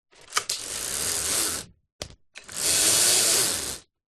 household blind shade pull cord room slight distance; zapsplat